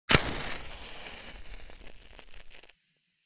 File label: Matches lighting with fire burning striking